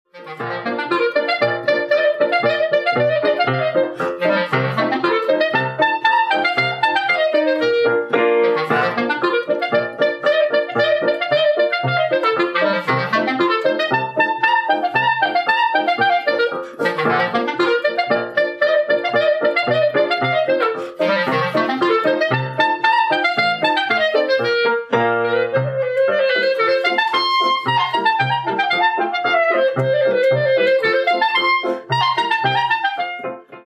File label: Clarinet Polka